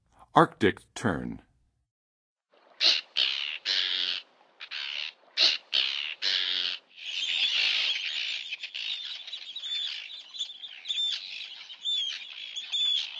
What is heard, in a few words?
Arctic Tern, Birds of North America; Western USA; Western USA Songbirds